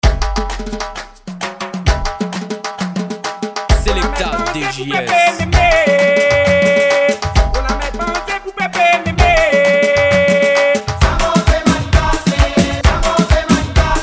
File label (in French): Maloya Remix, AMBIANCE TAM SALEG